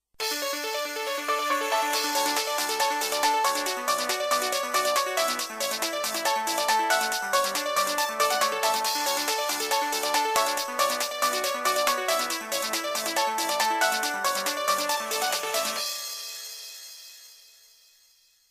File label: Nokia T20 Destino